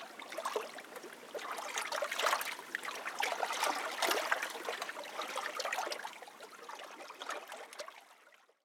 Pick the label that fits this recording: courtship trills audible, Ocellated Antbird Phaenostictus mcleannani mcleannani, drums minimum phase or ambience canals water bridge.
ambience canals water bridge